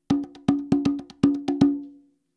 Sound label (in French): frise, congas